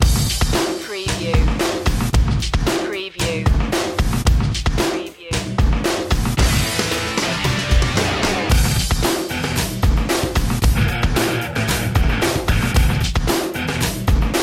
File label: battle beat